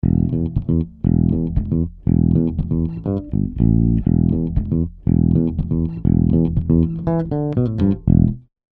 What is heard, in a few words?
Shelford Channel E Bass Silk Blue EQ; Rupert Neve Designs